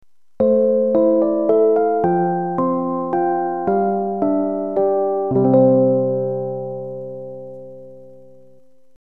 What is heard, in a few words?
Jingle oyasumi